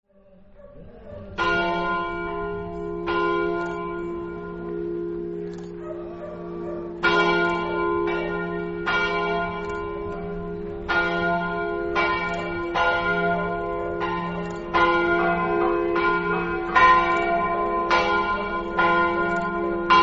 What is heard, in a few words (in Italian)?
ruttars, campane